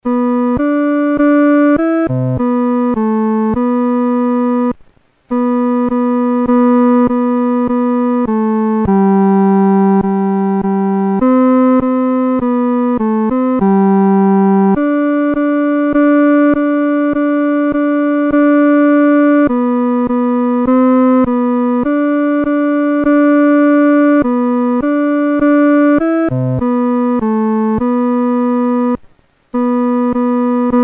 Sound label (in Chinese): 独奏 第三声